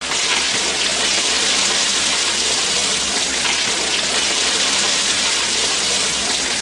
Frying Chicken, Sound of chicken frying in a pan. Great sizzle sizzle.